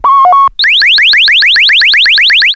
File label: lock on target